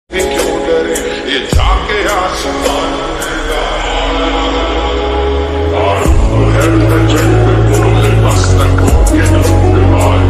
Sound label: ringtone bgm attitude